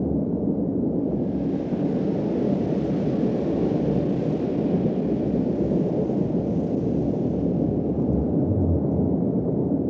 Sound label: zoom ambient planet class; Stellaris